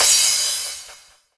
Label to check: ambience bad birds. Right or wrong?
Wrong